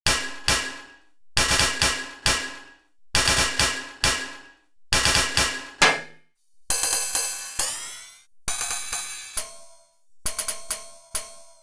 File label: RIDE LFO